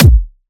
acoustic kick drum single shot a key, kick sample tuned to the A note; kick drum samples